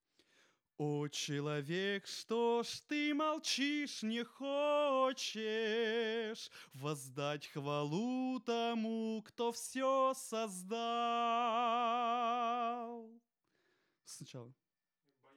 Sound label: vocal